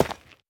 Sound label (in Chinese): 远古残骸 破坏声